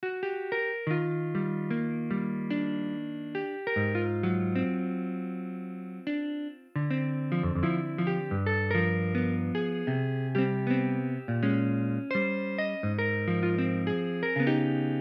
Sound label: synth Trans